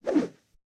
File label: fighting double air movement; soundscrate